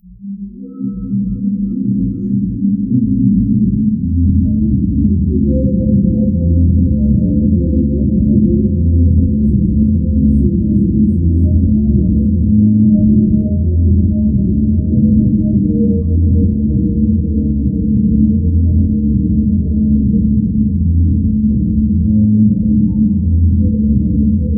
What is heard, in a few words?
random melody